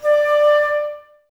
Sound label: Flute, Woodwind